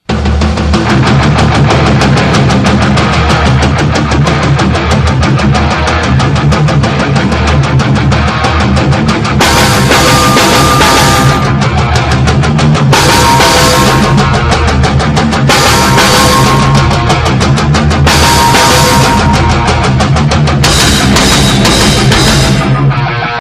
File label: Full chaos of Rock